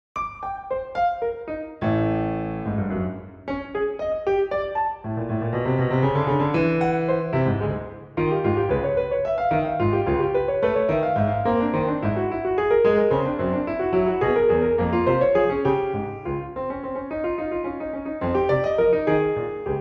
Piano Solo

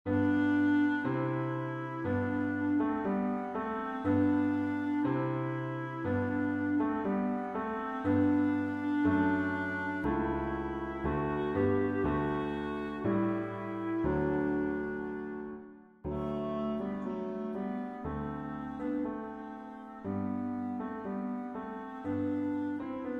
Klarinette Klavier; O du Froehliche; Besetzung: Klavier; Schwierigkeitsgrad: 1 - Sehr Einfach; Tonart: G-Dur

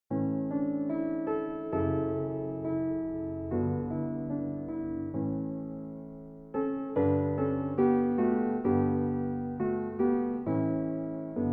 Von Quinten Kurzes Spiel; Von Quinten 4

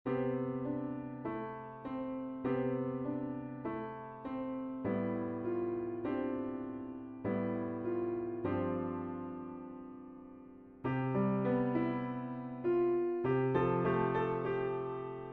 Chill Gloeckchen Chill

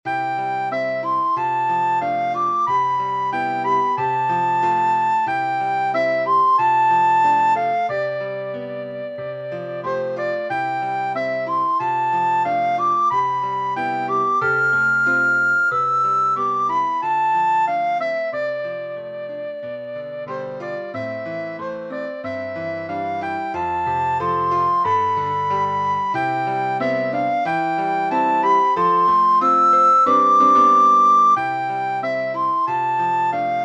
Blockfloete Klavier; Besetzung: Sopranblockflöte und Klavier; Schwierigkeitsgrad: 2 - Einfach; Tonart: C-Dur

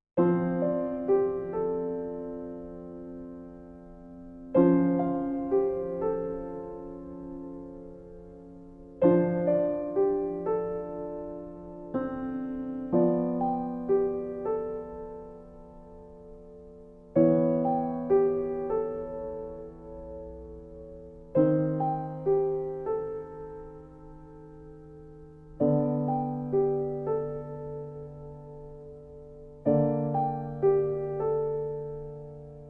Hineinhoeren Ostinato

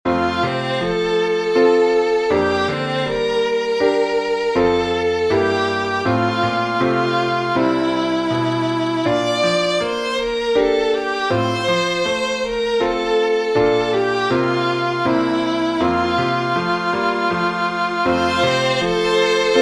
Dona nobis pacem Klavier; Besetzung: 2 C-Instrumente und Klavier; Schwierigkeitsgrad: 2 - Einfach

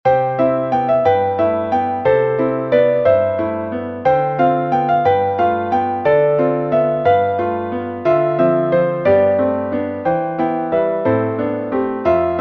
Bienchens Blumenwalzer

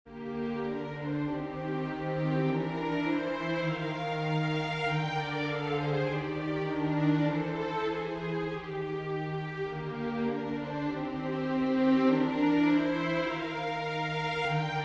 Pentatonische Weise

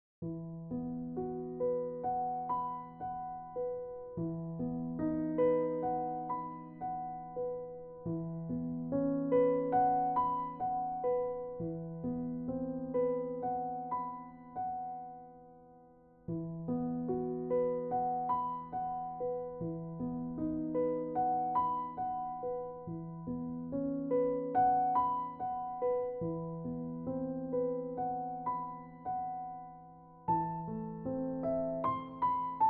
Hineinhoeren Klang verzoegern

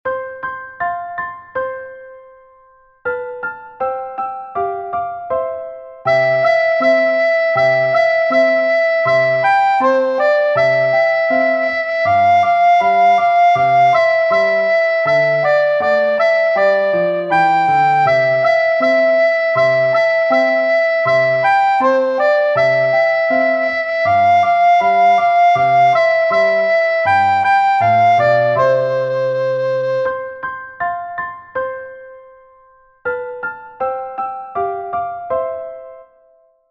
Jingle Bells Blockfloete; Besetzung: Blockflöte und Klavier; Schwierigkeitsgrad: 1 - Sehr Einfach; Tonart: C-Dur

